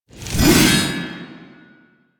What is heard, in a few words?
Backstab Impact; Warframe; Warframes; Pax Duviricus